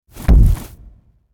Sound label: Footstep From Monster Dinosaur; Hollywood Edge; sound designer's toolkit v2